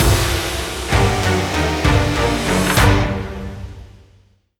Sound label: lose; Power Rangers vs Teenage Mutant Ninja Turtles - Ultimate Hero Clash 2